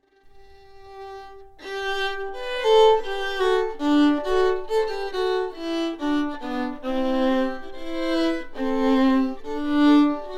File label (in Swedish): slängpolska stämma; Mänicas